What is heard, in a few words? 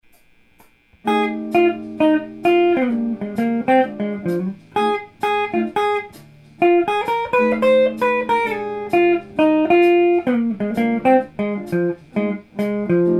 jazz solo, guitar